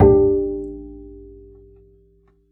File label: Cello D Bass